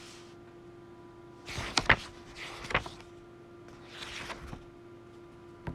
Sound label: Flip Paper